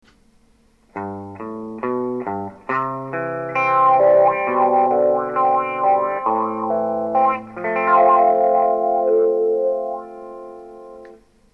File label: wah, effects; AutoWah